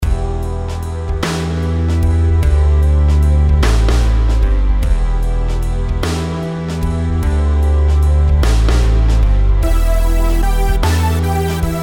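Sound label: melodies compound 8s E minor; melody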